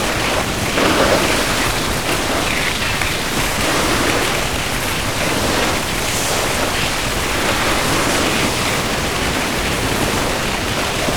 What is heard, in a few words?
snowmobile surface snow; mono; Call of Duty Modern Warfare